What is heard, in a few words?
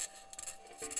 mic, mars2020 edlcam